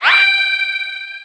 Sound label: Magic ICE CAST